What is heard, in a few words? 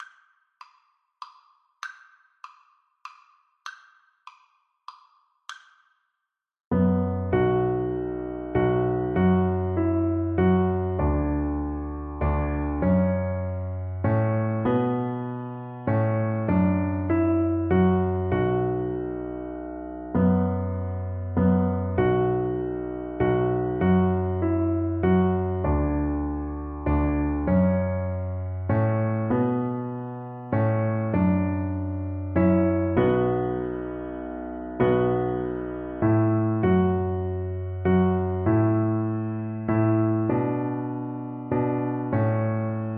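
VLC karaoke, Cello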